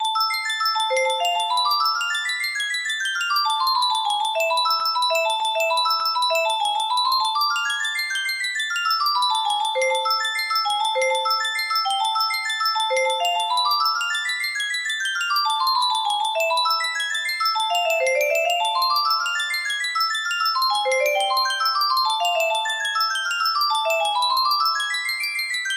in A minor, music box melody

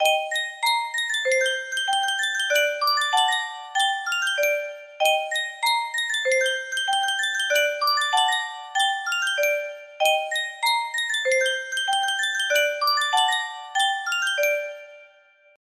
Yunsheng Custom Tune Music Box, music box melody

